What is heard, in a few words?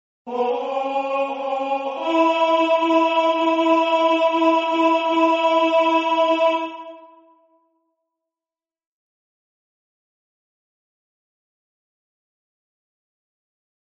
tenor